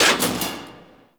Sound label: Rail Jack Missile Launcher Mech B; Warframe; Vehicles; RailJack Weapons